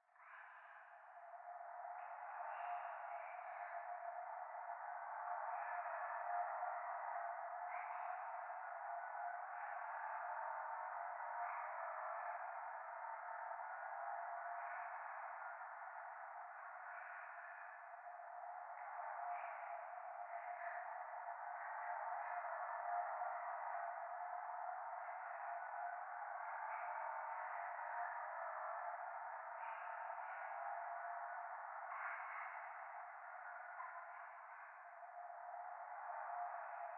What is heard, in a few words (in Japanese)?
左耳密着処女喘ぎ 車の中, ASMR; 廃墟